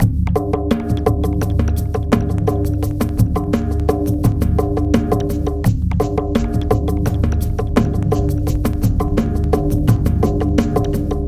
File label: FAT FRAME FRACTAL GROOVE; Sonic Foundry (Sony Creative Software); Vir Unis Infusion